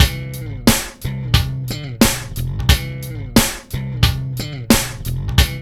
LOOP, Funky Ass Loops; East West

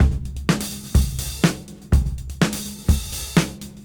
Disk 125 BPM; AMG - Keith LeBlanc Phat Butt Jams

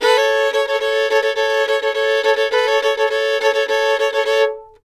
FIDDLE; Sampleheads; Whole Lotta Country 1 & 2

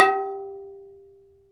MALLET; World Combo

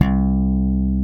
Alembic Slap C1; Mo' Phatt; Digital Sound Factory Mo' Phatt

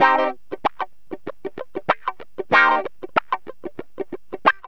GTR, CHANK; Zero-G; Funky Elements

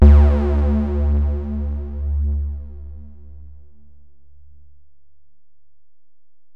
Bell, Alesis DM-PRO

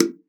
BONGOS; Xsample VOL-9

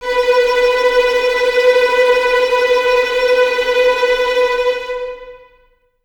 VS B 4 Tremolo, 23 Violins; Miroslav Vitous

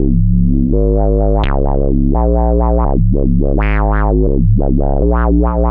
Wow Bass, Drum n Bass; KJ Sawka; Drum n Bass & Beyond

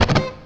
FX07, FX guitar; USB Soundscan vol.31; Miro s Grooves; AKAI

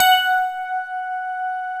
piano F#4; AKAI S-Series CD-ROM Sound Library VOL-8

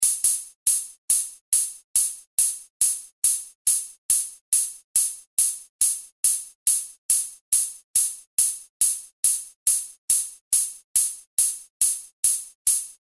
hats, industrial

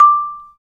Bass Marimba

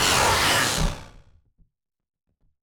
Malfunction Synth, malfunctioning synth samples

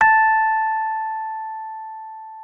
MKFF A5, RHODES; Xsample VOL-1

